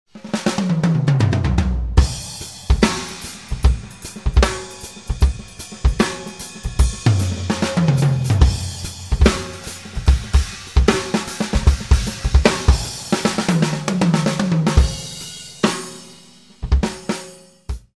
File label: Shuffle Groove